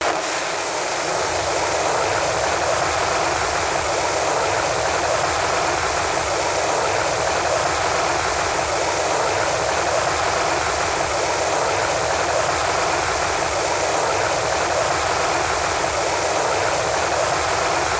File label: burn, flaregun; weapons